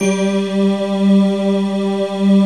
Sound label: D50 Stak, Roland